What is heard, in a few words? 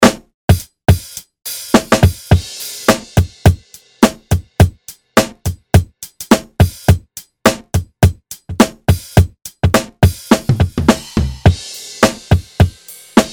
Groove